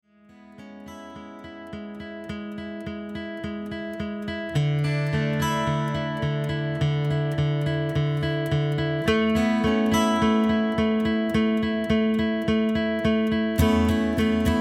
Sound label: guitar